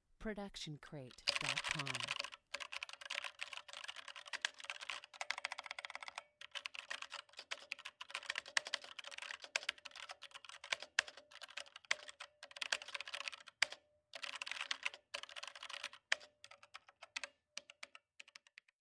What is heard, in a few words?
computer keyboard typing frantically; soundscrate